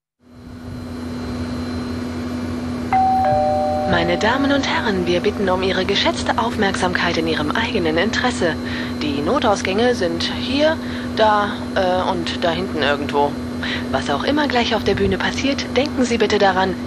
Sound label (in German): Intro mit Jingle